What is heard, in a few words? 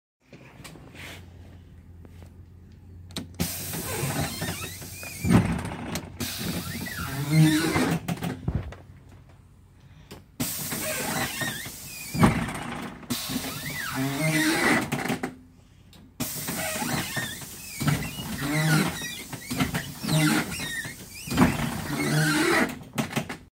school bus